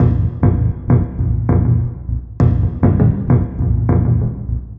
Djun Beat, Acoustic Beats; Sonic Foundry (Sony Creative Software); Myths of Technology

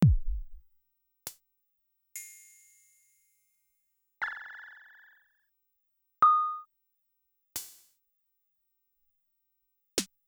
LXR; Sonic-Potions; Mars

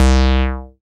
SYN TB SUB, Roland TB-303

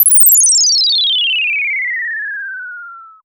SYNTH FX

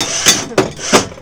HYDRAULIC, Industrial; SFX

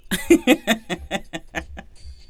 FEM, Hip Hop

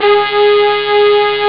roll; Amiga Soundtracker Sample Packs

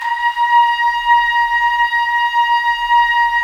Trumpets; Roland - LA Composer vol. 4

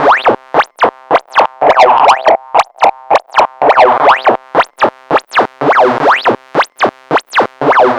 Noise, LFO & Noise

